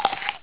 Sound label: Apple Bite